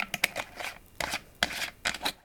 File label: CAP CLOSE, KITCHEN; 1000fx